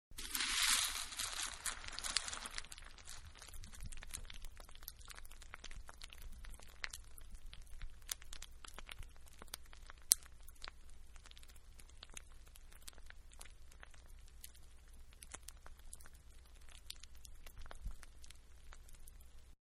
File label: Cereal Milk Pour Onto; Pouring (Sound Effects:Objects); Cereal-Milk Pouring Onto 2